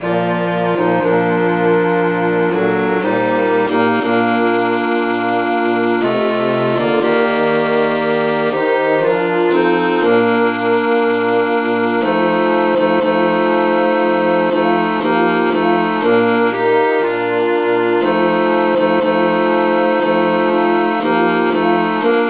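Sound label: traditional key E flat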